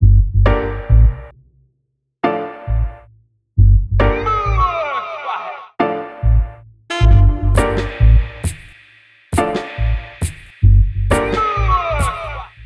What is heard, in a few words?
Reggae Soundtrack; Reggae Soundscape